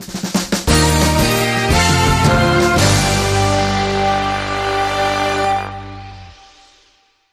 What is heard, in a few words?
Headline News Bed, Sting